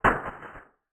warrior groundslam; action RPG game

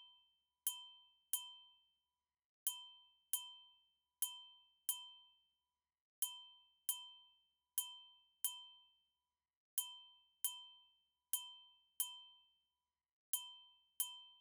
xtra perc; action RPG game

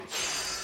progress

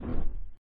collect soul; Adventures in Lestoria

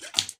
slime shoot; Adventures in Lestoria; action RPG game; Sig Productions

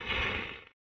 wisp hit; Adventures in Lestoria; action RPG game; Sig Productions

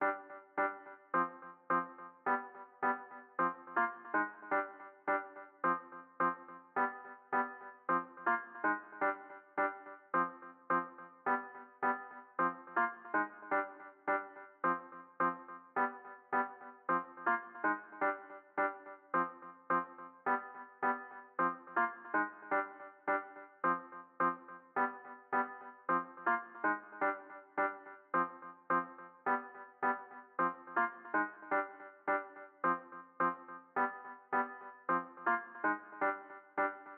piano, music; action RPG game

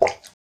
slime dead; Adventures in Lestoria; action RPG game; Sig Productions

